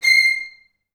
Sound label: C6, Advanced Orchestra; Best Service